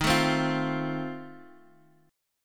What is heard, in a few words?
F 7th D sharp